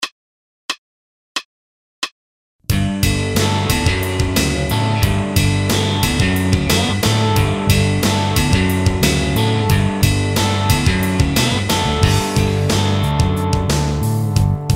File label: 50 licks progrock